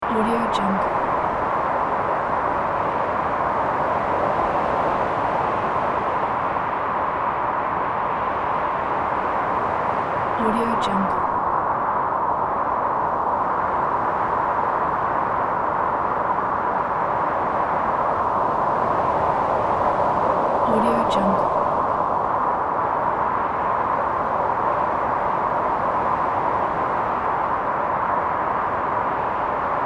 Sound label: cold arctic wind loop; magicsoundeffects